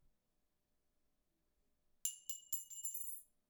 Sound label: Small Metal Object Drop